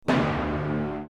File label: accord